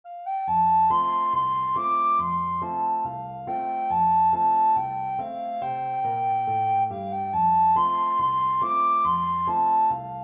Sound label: recorder